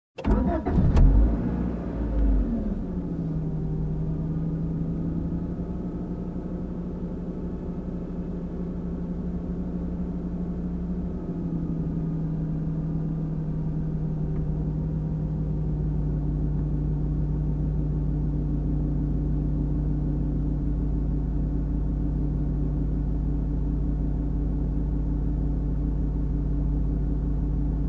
Car ignition engine idle

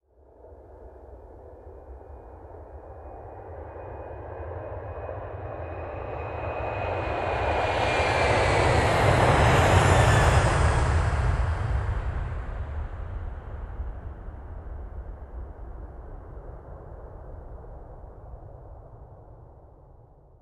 Aircraft Ascending

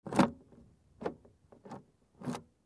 Car key turning unlocking

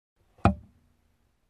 Dull click

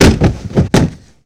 Body falls and rolls

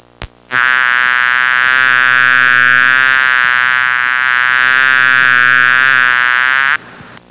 Mosquito buzzing upclose sound

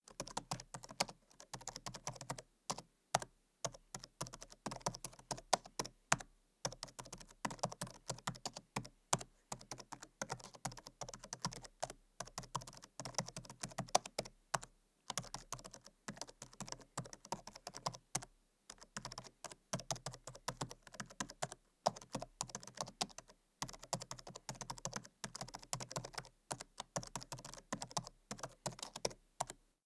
Laptop keyboard

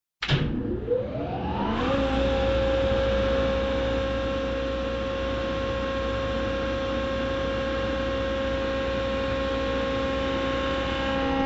Heavy Machines Successively Turned on

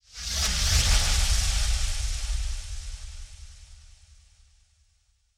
Scary fading dash

